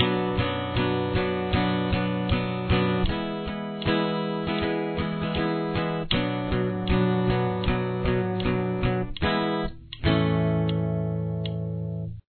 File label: chorus, guitar